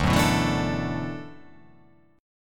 D 6th Add 9th D

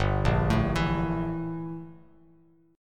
G Minor 6th Add 9th G down Bass Standard; Gm6add9 arpeggiated; JGuitar

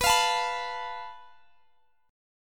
A Suspended 2nd Sharp 5th

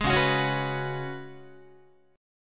C sharp 7th Suspended 4th C sharp, Guitar; C#7sus4; strummed; tuning=4A,4E,5A,5D,5G#,6C#; guitar chord

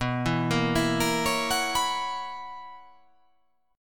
B Major 7th Suspended 2nd Suspended 4th B

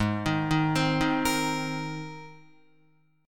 G sharp Major Add 9th G sharp, Guitar; G#add9 Chord

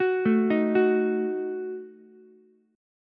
Gb Minor 6th, Ukulele, Gbm6 chord; arpeggiated; JGuitar

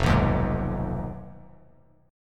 F Minor 7th Flat 5th F down; Fm7b5 strummed; JGuitar

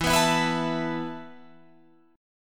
E Suspended 4th E, down, Guitar, Open F; Esus4; strummed

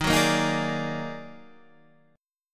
Eb Minor Major 11th, Guitar, Standard; EbmM11; strummed; JGuitar

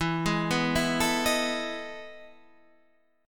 E Major 11th down Guitar Standard; EM11 arpeggiated; JGuitar

